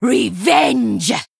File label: Female Troll Attack